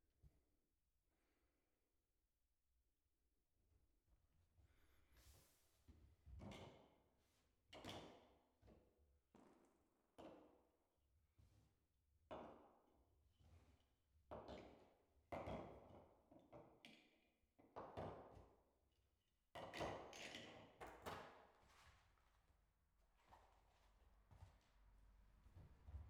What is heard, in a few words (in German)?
Nebengeraeusche; Lichtenberg (Brandenburg)